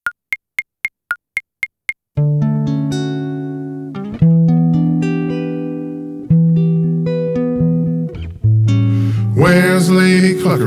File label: Vocal; Mini Musical Tales